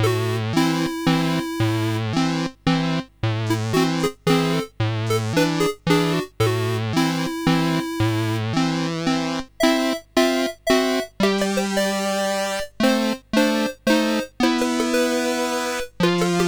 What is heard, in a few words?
Waltz in A Minor, CSG8580R5; Terry